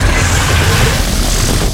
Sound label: spin up; weapons; tf2classic